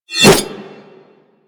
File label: Tonfa Whoosh; Warframe; Tenno